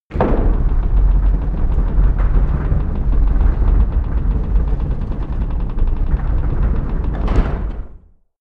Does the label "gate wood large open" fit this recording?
Yes